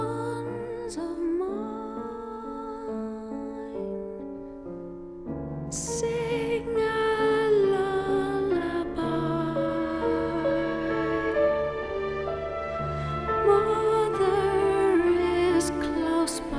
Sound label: Instrumental; Soundtrack; Classical